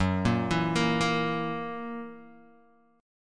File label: Gb 7th Gb down Guitar DADGAD, chord